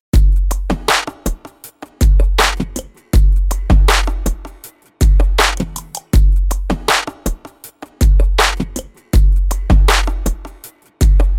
bpm 80

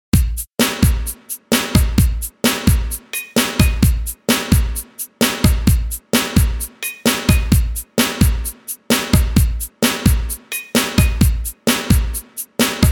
beats loop track bpm 130

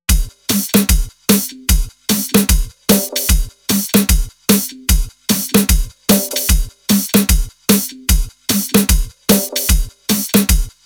bpm 150

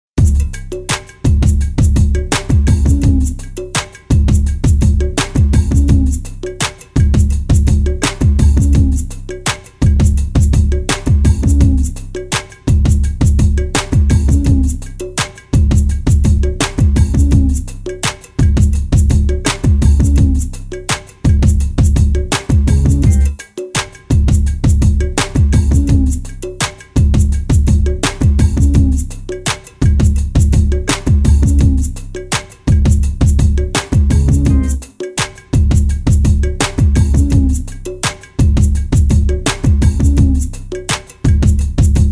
loop bpm 84

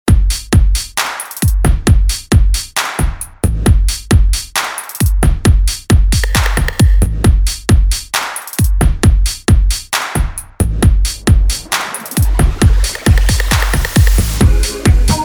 bpm 134

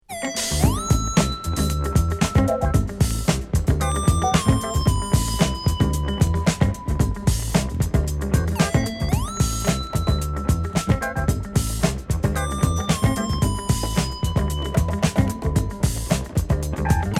beat

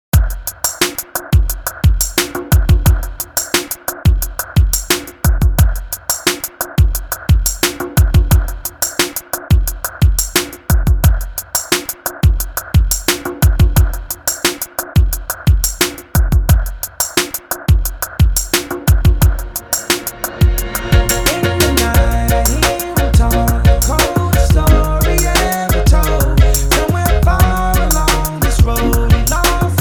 bpm 88

